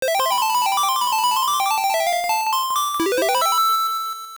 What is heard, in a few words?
Harp; Play the Tune of Ages; Oracle